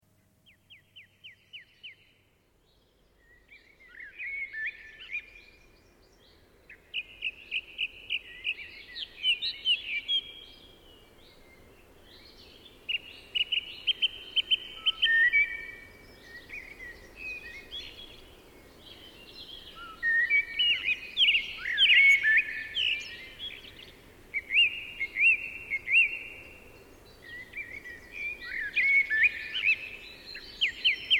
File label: Postlude, Dark Harpsichord Music; Colin Booth